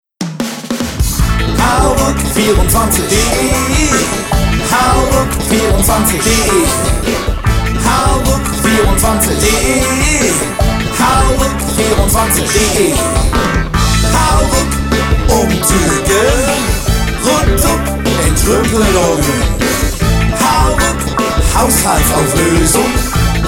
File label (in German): werbejingle; hauruck24